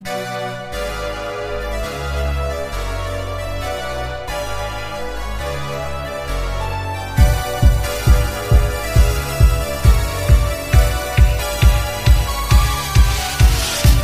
synth

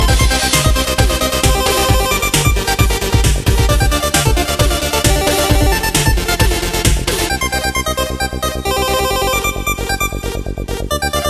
Last 8bit

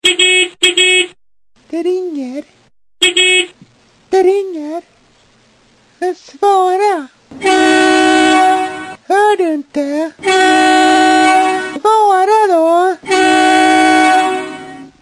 Funny Traffic Horn

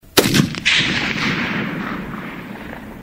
Sniper Rifle

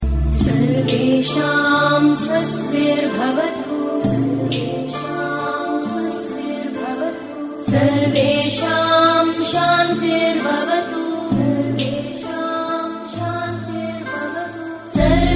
Hindu Devotional

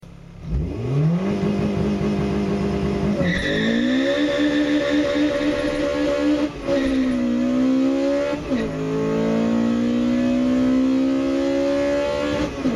Honda V-TEC Sound

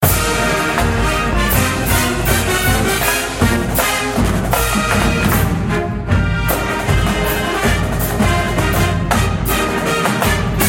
Drum Major

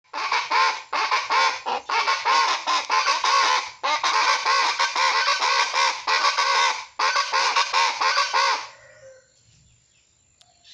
Guacharaca

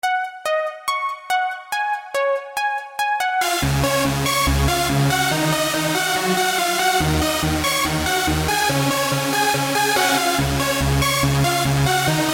Harder Trance Tune

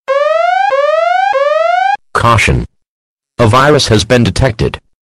Virus Warning; meloboom

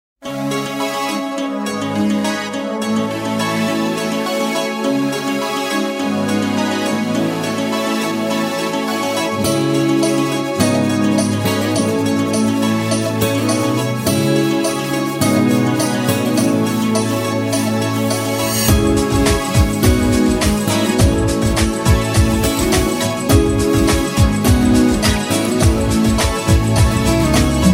Mobile New Tone

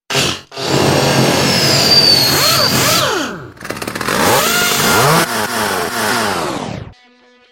Chainsaw

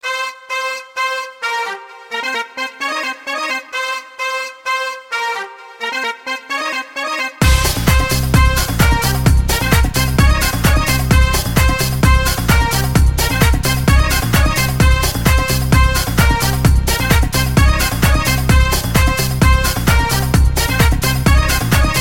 Dance; Sheik

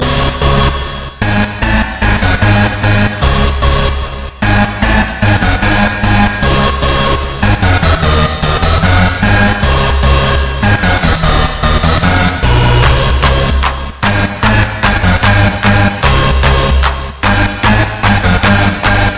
Piano

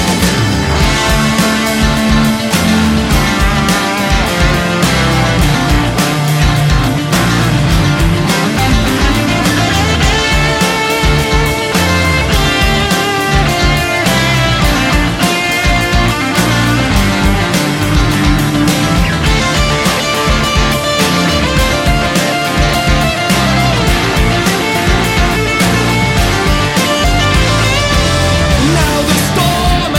solo

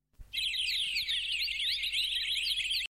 Birdy Msg

